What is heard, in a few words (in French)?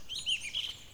Oiseau nerveux; Oiseaux